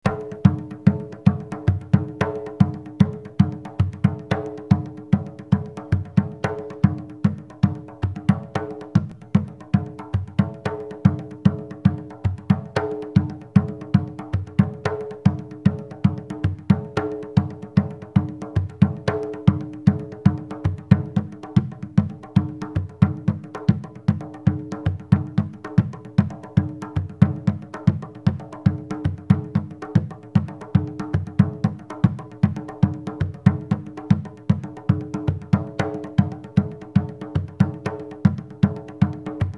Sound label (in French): rythmes takouta basse